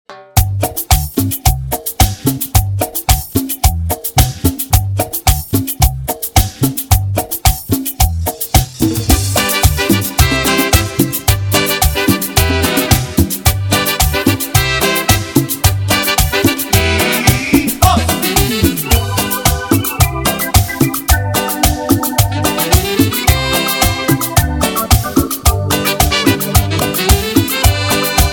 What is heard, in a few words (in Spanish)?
Mosaico, Extended Cumbia, 110 Bpm